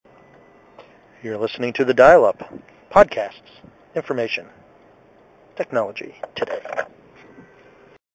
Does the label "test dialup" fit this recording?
Yes